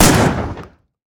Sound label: pump shotgun; fight